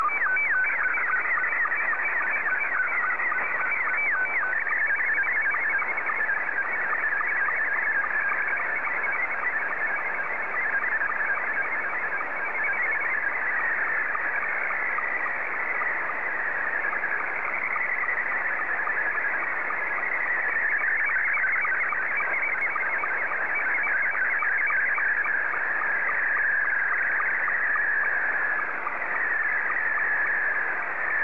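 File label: SSTV, Scottie 2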